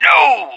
whack smash; npc; source engine; left 4 dead 2; moustachio

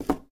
place frame, foley; video games; source engine; ep2; eli

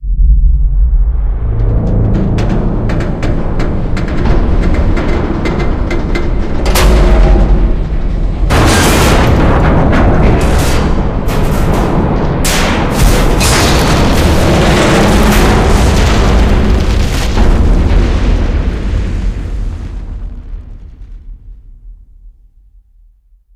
neurotoxin destruction

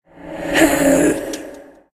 sword hit

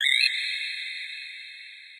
perimeter crickets; Source Engine; tf; lair

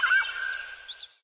dist birds, forest; video games; ambient; source engine; portal2

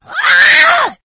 rage at victim, infected female

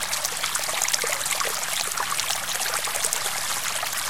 trickle; source engine; left4dead2

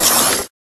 puck slide up; Left 4 Dead 2; Source Engine

